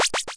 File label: 8bit kit powerup, 8 Bit Videogame Kit; LO FI and 8 BIT KITS